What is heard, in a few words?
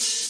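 classic hip hop Dry open hi hat; STYLE KITS (Hip Hop, Etc); Classic Hip Hop Mega Kit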